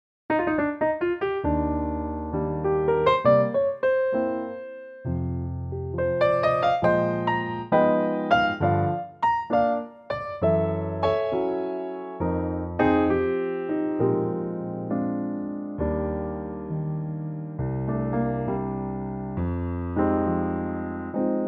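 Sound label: Eb piano, male; key - Eb - vocal range - Bb to Eb; Gorgeous piano only arrangement of this classic standard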